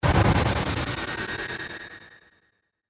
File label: Burst Reverb